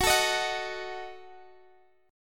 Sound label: Gb Minor 6th, Guitar Open D